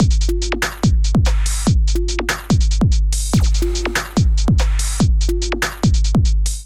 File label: Beats 144 bpm; Ableton; Factory Packs; Skitter and Step; Hyper